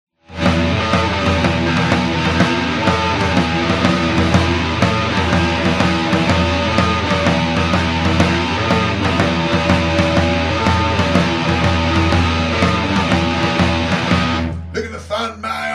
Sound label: beat